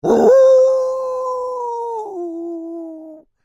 Creature Wolf Howl Long, Human Imitates Wolf; Wolves; Animals

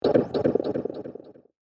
Sting Choppy Delays, sound design; Stingers; Big Room Sound

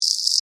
Insect Voice Chirp, Vocalization, Bug; Animal

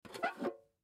Candy Tin Pick Up; Table; Foley; Pick Ups; Objects; Blastwave

